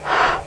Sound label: blow; Sound Effects for Multimedia - Volume 2